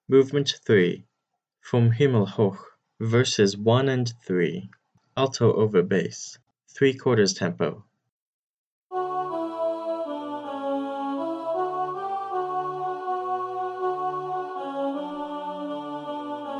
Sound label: alto over bass three quarters tempo